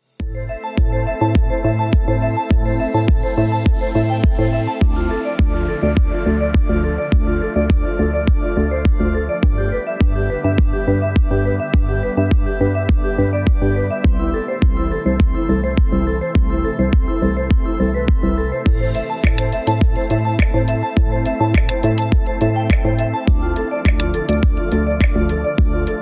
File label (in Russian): Пробуждающий спокойный будильник